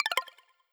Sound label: Close Or Disable, Modern UI SFX; Slides And Transitions